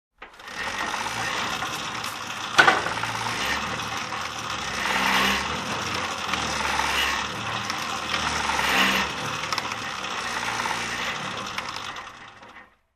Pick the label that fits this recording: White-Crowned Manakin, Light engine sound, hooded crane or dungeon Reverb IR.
Light engine sound